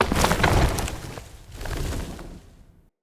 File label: Root Shield Hit; Puzzles; BeanStalk